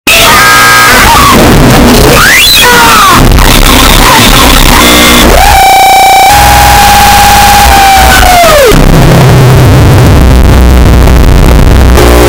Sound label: screaming, but its EXTREMELY BASS BOOSTED; SpongeBob